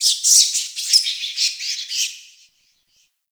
Forest Distant Medium Creature Small Cranky; Ambience; Warframe; Grineer

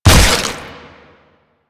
Proto Infested Ancient Step, Steps

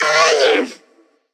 Repair Drone Idle Angry; Necramech; Battle Barks

